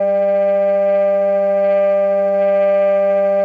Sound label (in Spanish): Tremolos